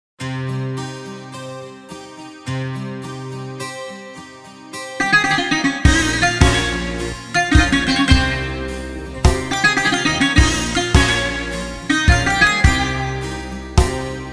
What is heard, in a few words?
Bouzouki zeimp style intro